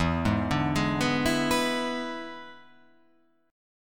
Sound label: E 7th E down